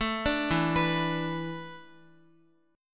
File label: E 7th Suspended 4th, Guitar; E7sus4; arpeggiated; x,x,7,7,4,6